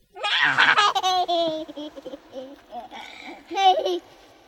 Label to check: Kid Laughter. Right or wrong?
Right